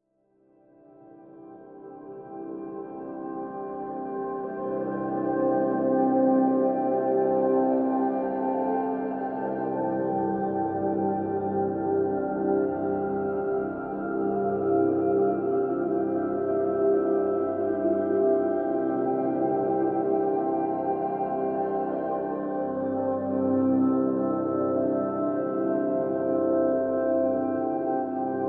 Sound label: PADS Celestial (C)